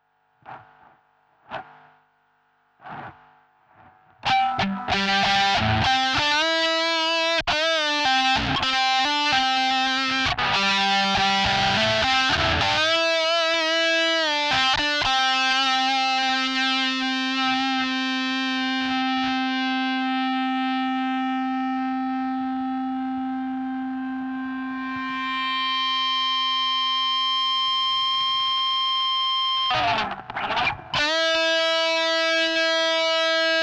noise reductor; behringer pedales guitare

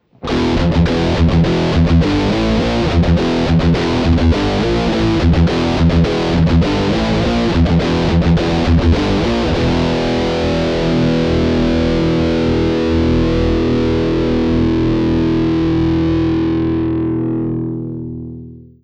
sansamp hard; behringer vtone

